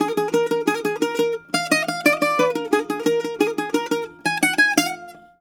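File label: A#, bouzouki; USB Soundscan vol.53; Mediteranean Traditions; AKAI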